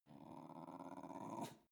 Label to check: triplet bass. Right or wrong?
Wrong